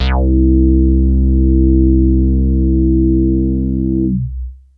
Big Synth Basses

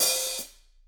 HH, Drums; Bob Clearmountain Drums I

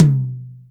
TOM, Drum Dry; East Collexion; Drum 1

